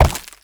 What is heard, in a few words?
Step Ice; Warframe; Uriel